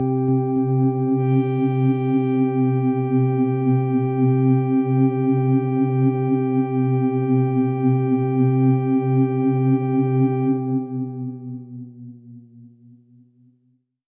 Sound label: Pad C, Synth Prophet One Shots; Electronisounds